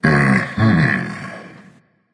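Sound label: non verbal agree, voice; cernunnos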